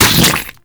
Venom Tick; Warframe; Saryn

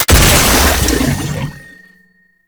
Archgun Last Shot; Warframe; Weapons; Archwing; Tn Nokko Archgun

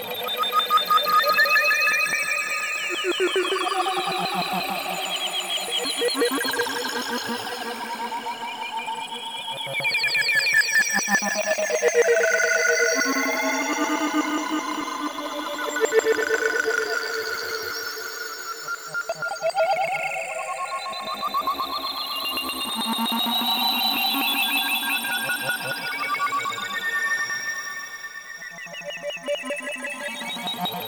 Mushroom Patch Stereo Loop, Ambience; Props; Warframe; Nokko Colony

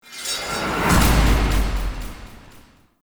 Trick Ghost Cast; Enemy; Abilities; Characters; Circade

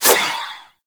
Proj Throw; Hoplite Army Replicant; Warframe; Warframes

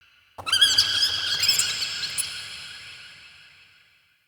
Root Cave Small Critter Squeaks; Ambience; Neathuns Root Cave

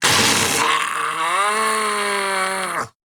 Death Grineer Ghoul Sargeant; Grineer Ghoul Drill Sargeant; Battle Barks

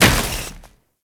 Moa Combined Step Snow; Enemies; Warframe

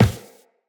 Run Default, Footsteps; Characters; Tenno

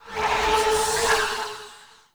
Generic Foley; Warframe; Choir

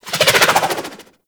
SMG Projectile By; Warframe; Tenno; Sundial SMG; Weapons

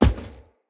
Heavy Grunt Boot Walk Wood, Footsteps; Enemy; Sinecure

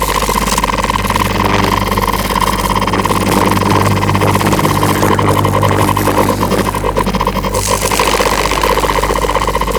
Sentient Rifle Shot Loop; Warframe; New War

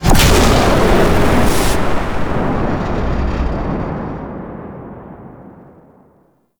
Void Dragon Firebreath Cast; Enemies; Abilities; Warframe; Duviri

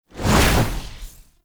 Fuel Projectile Throw; Gameplay; Warframe; Jade Shadows; Ascension

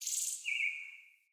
Bird Song; Josalfar Bird

